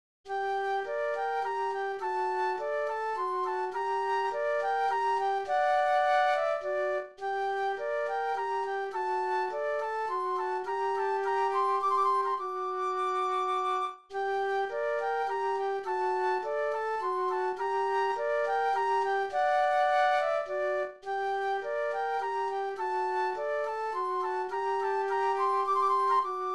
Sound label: minuet flute duet; classical